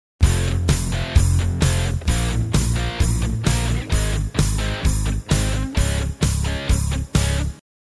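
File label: Palm Muting can be accented; mute and release the strings to form different rhythm patterns, and add pushes and accents to the riff; Power Chord Lesson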